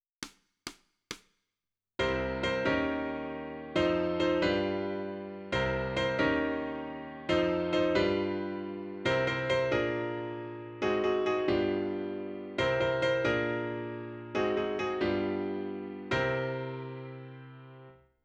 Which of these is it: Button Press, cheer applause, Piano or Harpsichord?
Piano